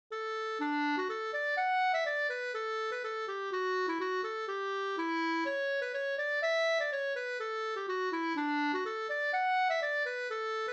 schottis lybeckare; Tuven

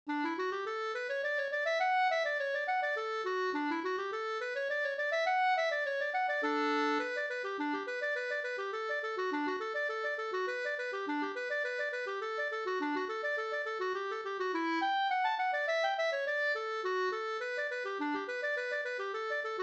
slangpolska

